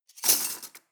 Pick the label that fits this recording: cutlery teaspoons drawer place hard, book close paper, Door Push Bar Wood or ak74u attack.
cutlery teaspoons drawer place hard